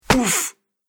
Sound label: Body Blow Punch Oof; Punch And Hit Sound Effects; Human Body Sound Effect